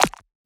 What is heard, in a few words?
Perc, Percussion; FLPRO